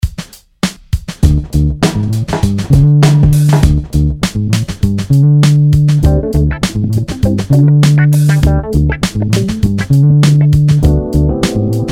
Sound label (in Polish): Rap na koniec lata wersja instrumentalna